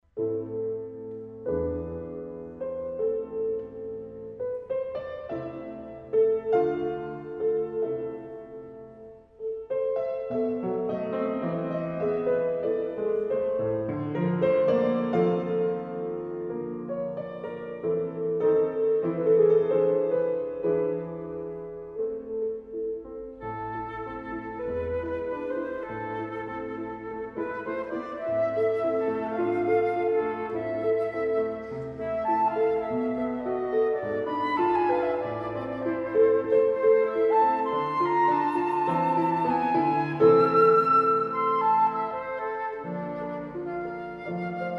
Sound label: Schubert Arpeggione; F. Schubert Sonata "Arpeggione"